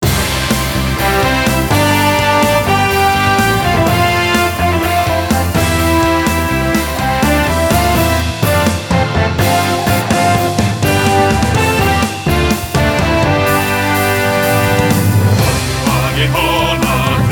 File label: song cheering